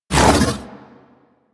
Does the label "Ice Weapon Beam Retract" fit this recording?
Yes